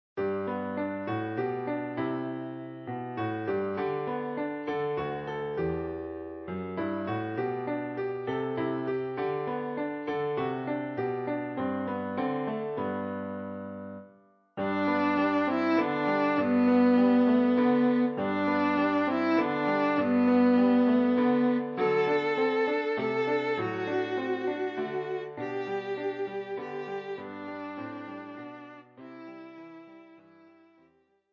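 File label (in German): Bratsche; Silent Night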